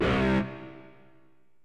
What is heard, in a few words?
overdrive